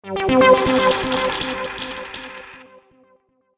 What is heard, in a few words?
Game Gold Treasure Bonus, Video Game Sound Effects